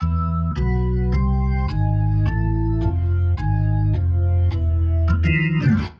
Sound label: Perp Organ, 80bpm, E; Loops n Lines